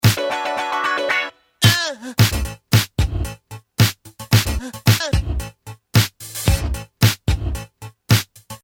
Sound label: loop mono